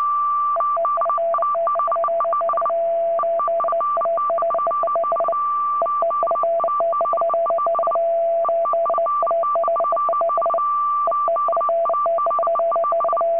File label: RUS DIPLO 25-Bd FSK SEQUENCE